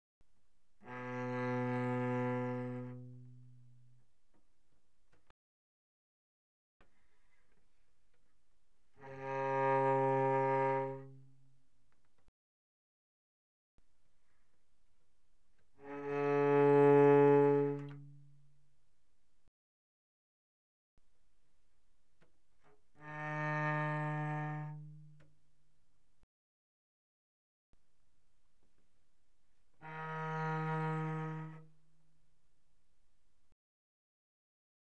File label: Cello arco pp sul G B2A3; Strings